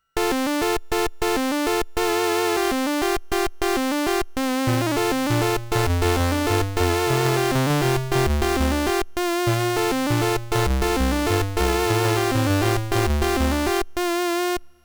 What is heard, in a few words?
c64